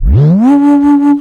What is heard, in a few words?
tape fx, scratch; Ambient Grooves